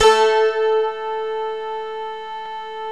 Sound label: CYMBALOM; Roland - LA Composer vol. 1